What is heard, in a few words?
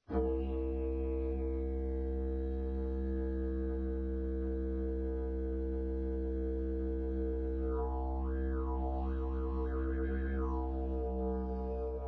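pvc C, didge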